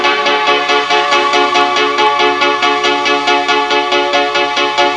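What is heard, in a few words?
keyboard; Sound Library 2000; Wayzata Technology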